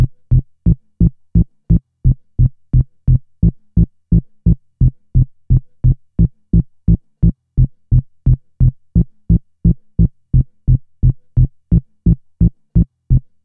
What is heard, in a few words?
Organ Low Mic